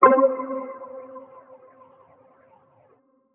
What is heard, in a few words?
Lofi Pluck One Shot - C